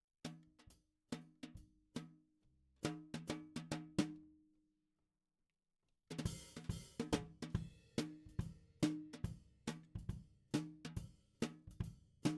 SNR top

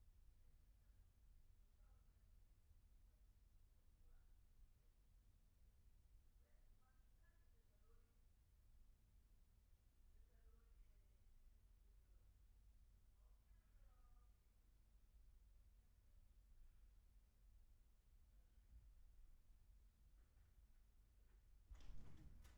BARI SAX C

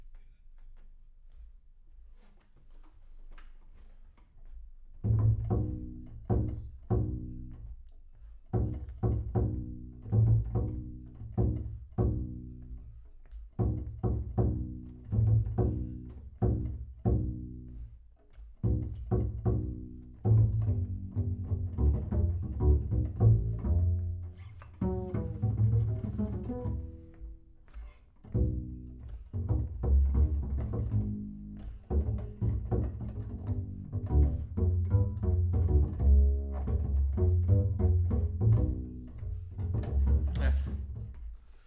Bass Room

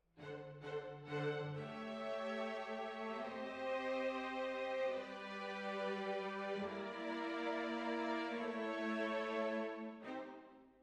Hall

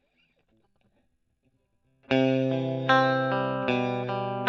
E.GTR; Gefell

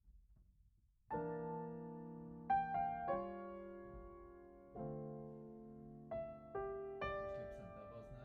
Piano Mono

